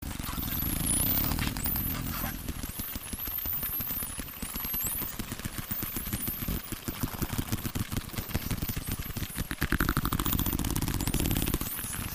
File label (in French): Dauphin longbec; cétacés; GLOBICE; fiches cétacés